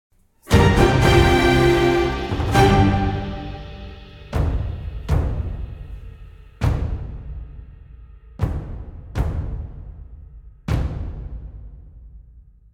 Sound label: Music Battle